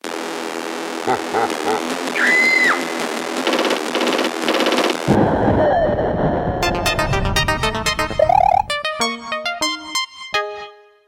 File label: Ringtone VIDEOGAME; Cherry Mobile Astro; ringtones